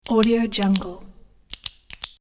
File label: the pen switching on off